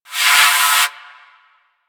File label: Ocean Steam Blast; Ambience; Warframe; Grineer Ocean